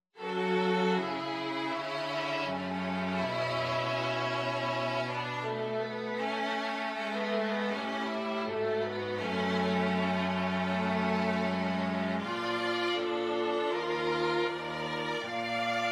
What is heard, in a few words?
STRING QUARTET